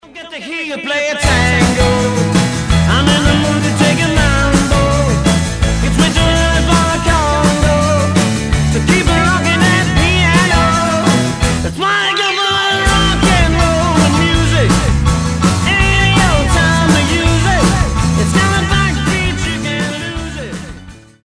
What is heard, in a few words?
Rock Roll Music